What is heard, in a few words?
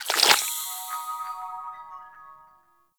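Power Shroom Projectile Pulse; Warframe; Nokko; Empowering Mushroom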